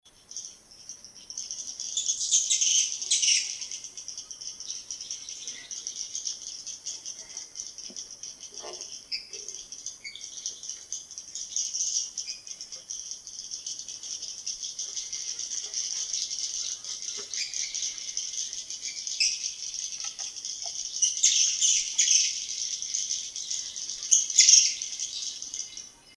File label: swiftlet screech